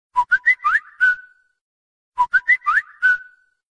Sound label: bip